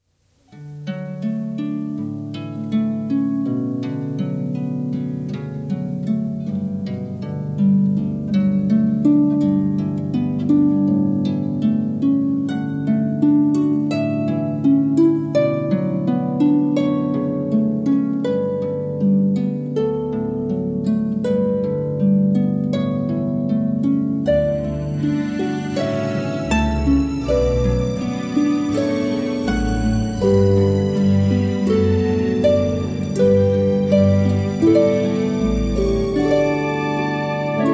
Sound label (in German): Harfen, Kanon in D-Dur